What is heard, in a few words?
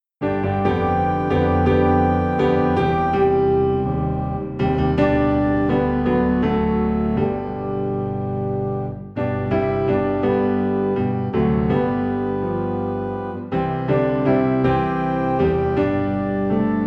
Piano and Organ Low in G